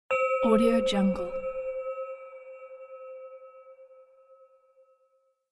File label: bells sound